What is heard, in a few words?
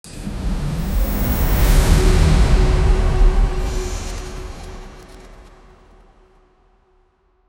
portal entrace sound, cosmos